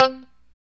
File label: POP C 4, STRAT guitar; Spectrasonics; Hans Zimmer Guitars VOL-1; JC-STRAT POP